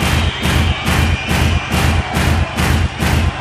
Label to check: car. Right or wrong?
Wrong